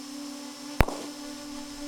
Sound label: 38 With Drone, Gunshot, 38 special